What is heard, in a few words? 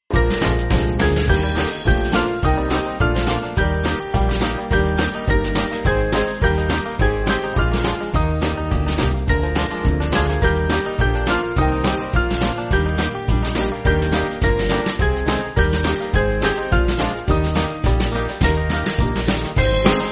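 Band, Backing Files for Churches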